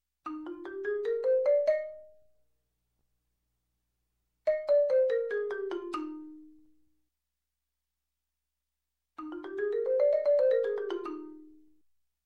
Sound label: Marimba up And Down Scale, e Flat Major, Music, Percussion; Sound Ideas 2000 Series